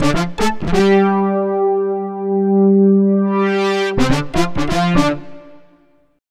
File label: Brass, Keyboards